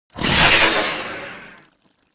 Grotesque Dragonhawk Death

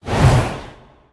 Frost Magic Attack Underlay

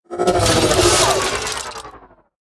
Scrap Golem Death

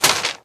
Bowl Scroll Poke

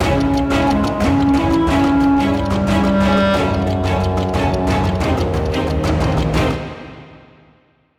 Stinger; Gnomelia Safe Pilot

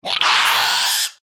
Thirsty Bat Play

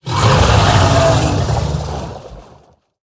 Naga Giant Attack

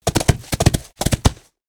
Four Hooves Play

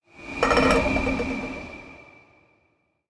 Sand Art Elemental, Play

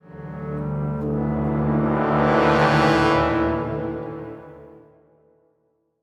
Play Stinger

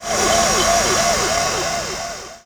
Alarm O Bot turn start effect

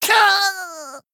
Male Gnome Death

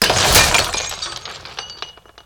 Death Underlay

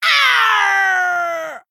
Female Orc Death

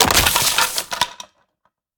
Magic Broomstick Underlay Death

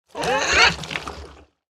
Tar Slime Attack

